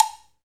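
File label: salsa cowbell; Northstar - Drumscapes Roland; Bonus Perc; Cowbells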